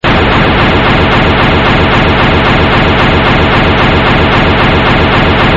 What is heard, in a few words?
laser